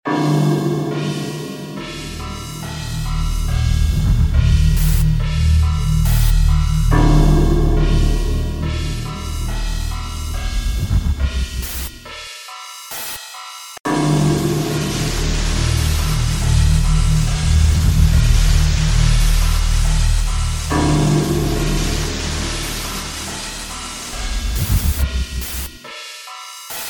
Industria

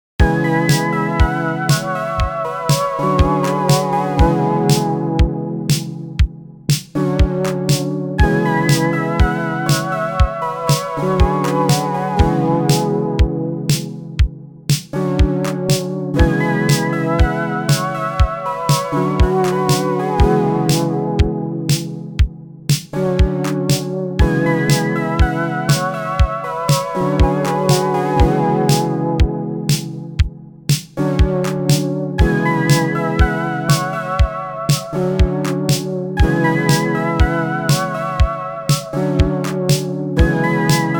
chill wave

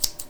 hair scissors

